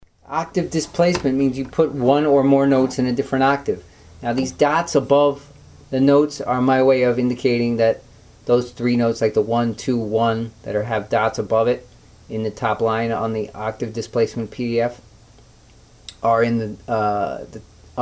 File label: varying a melody